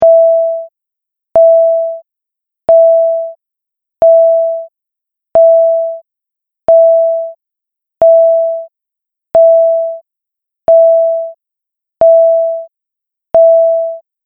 Warning Beeps Slow Speed; Beep Sound Effects

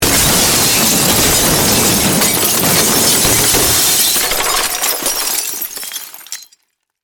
Crash, Wood, Glass; Wood Sound Effects; Materials

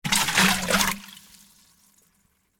Jug Shake Water, Open, Lid, Slosh; Foley; Shaking Sound Effects

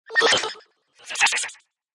Hit Space Beeps Twice; Scifi Production Elements; Scifi Production Elements, Set 1; LynneMusic

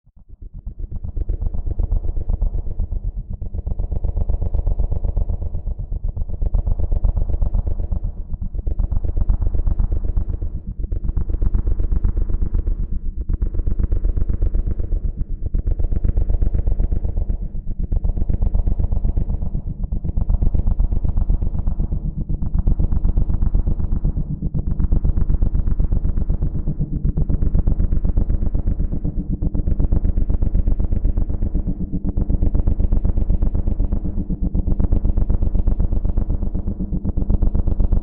Drone Chopper Pulsating Swell; Blastwave

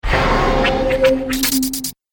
Radio Stinger

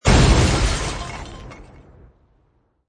Explosion With Glass Shatter; Bomb Sound Effects

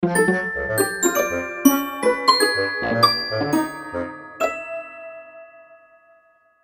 Orchestral Light, Orchestral Light Melody Playful; Cartoon; Musical; Blastwave

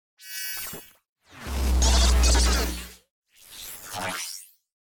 Static Feedback Warble Left To Right; Blastwave

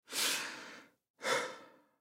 Breathe In Element Male 25 Yrs; Breathe In Out Element Male 25 Yrs; Breathing; Human; Blastwave

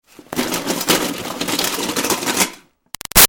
Foley Rummaging Junk Debris Busy, metal; Clangs; Materials; Big Room Sound

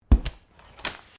Umbrella Close; Umbrellas (Sound Effects:Objects)

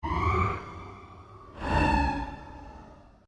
Created Cyber Whale Call, Alien Sound Effects

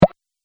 Pop Sound Game Fx; Video Game Sound Effects

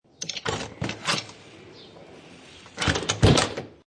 Old Wooden Window Open And Close

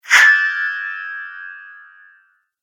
Chime Whoosh; Sound Design

